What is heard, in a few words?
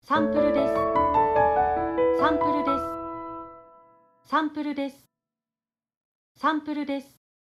Piano fast